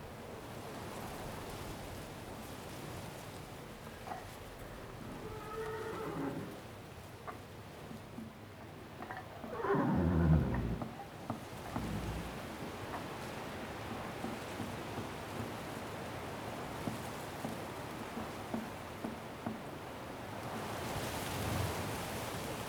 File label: ranch house, ambience